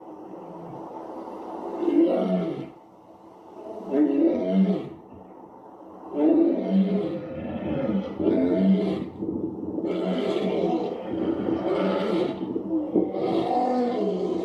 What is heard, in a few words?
Lion roaring